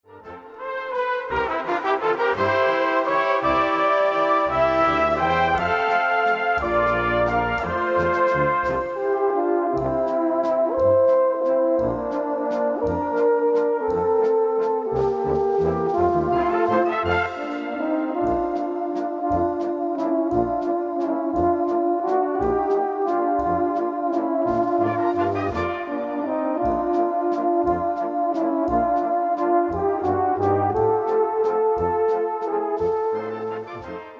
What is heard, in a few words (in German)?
Tenorhornwalzer; Zillertaler